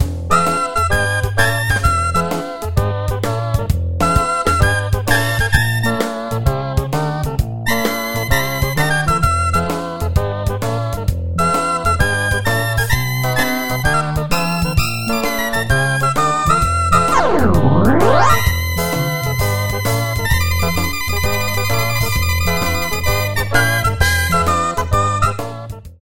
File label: key combo organ